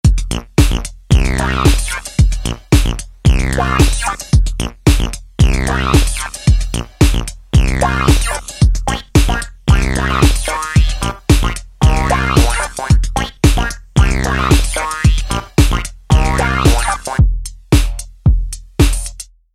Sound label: techno; killing techno move